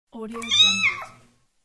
cat meow; ib-music

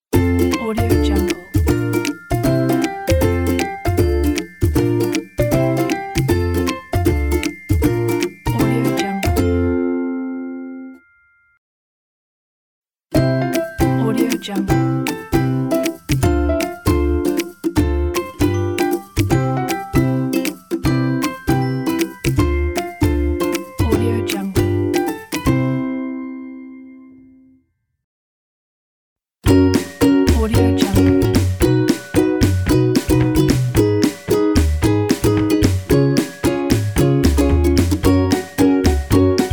happy logo pack

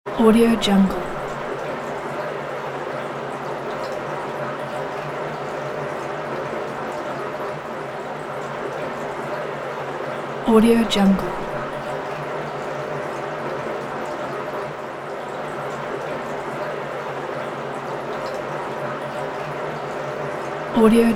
water machine

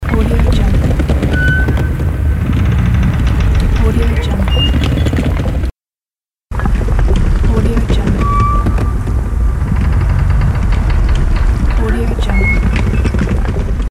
old castle tower

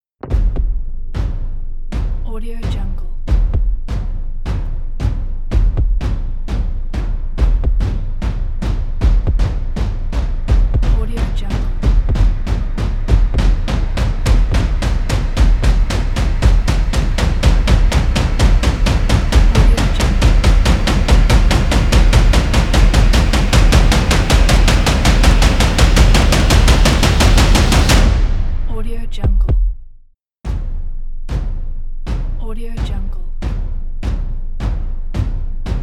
accelerating drums heatbeat trailer ident

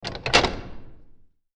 key unlocks dungeon door with reverb; hollywoodedge

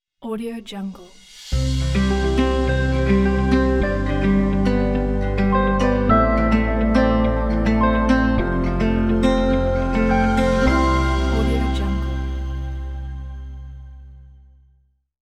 upbeat guitar intro ident

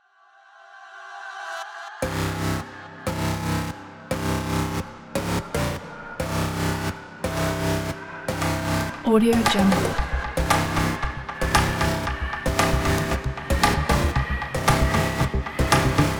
alert

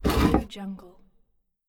heavy wood sliding into slot

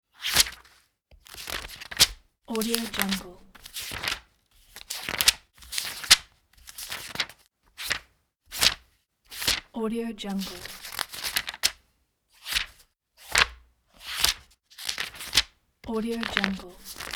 turning book pages

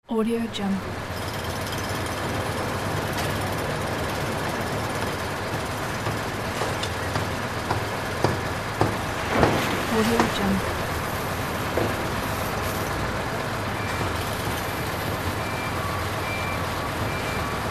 construction works ambience

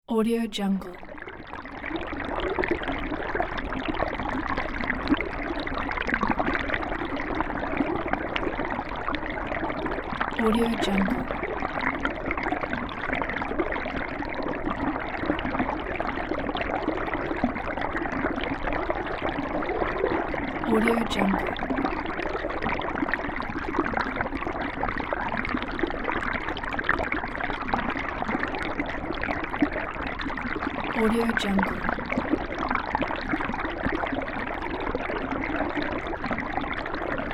river underwater; worldwidesounds

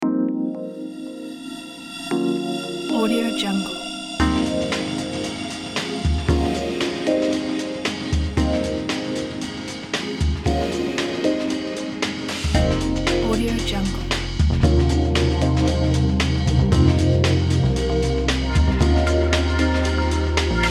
abstract chill fusion

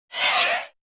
creature mix flying insects flutter vocal

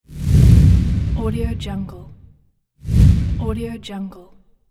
bleak whoosh

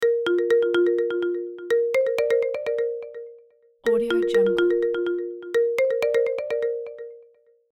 marimba phone ringtone

